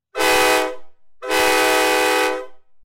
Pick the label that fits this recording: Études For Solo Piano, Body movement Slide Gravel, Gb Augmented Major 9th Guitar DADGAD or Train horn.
Train horn